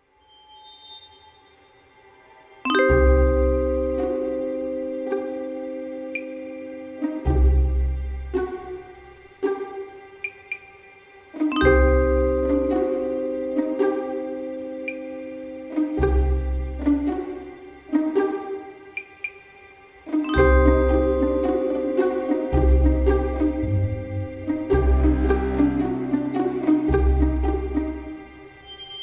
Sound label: office music; Hector Episode 1 We Negotiate With Terrorists